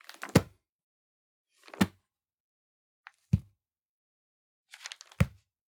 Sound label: Organic Paper Book Close; boom library; The Interface